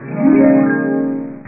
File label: harp